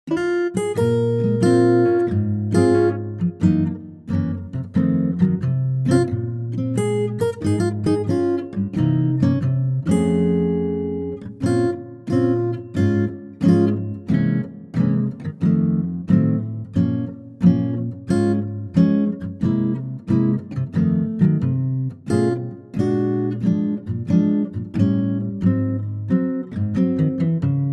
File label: Bbm acoustic guitar